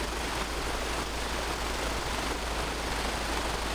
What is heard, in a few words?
Aircrafts Engines Packard Merlin Prop; DCS